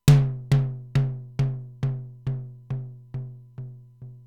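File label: Simmons SDS-9 high tom, Drum Machines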